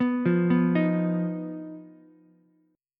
Eb Suspended 2nd Sharp 5th Eb down Ukulele, Ebsus2#5 chord; Ebsus2#5 arpeggiated; JGuitar